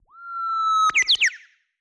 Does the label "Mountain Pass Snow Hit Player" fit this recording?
No